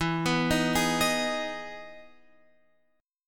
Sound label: E Major 7th E down Guitar Open F; EM7 arpeggiated